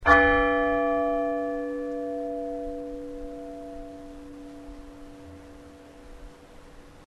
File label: bell; merthyr